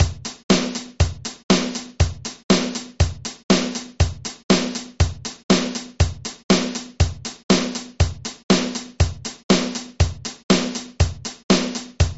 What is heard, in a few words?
120 BPM Basic 8th note rock and pop beat